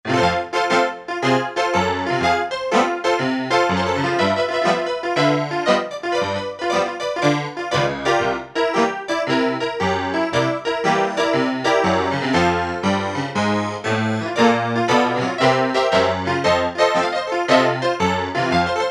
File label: kbd, E-mu Proteus FX; RoadHaus